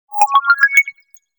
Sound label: Brushstroke, Stock Notification; HTC 10 - Sense 8 Sound Suite